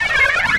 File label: Multiple interface error tones at various pitches.; Error, Interface Pitch, Tone Web Efx, Error